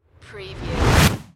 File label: Magic Spell Whoosh